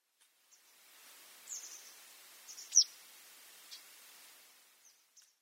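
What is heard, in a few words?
Citrine Wagtail; kigashiraseki; sakata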